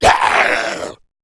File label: zombie vox male pain; tf; slender; rave in the redwoods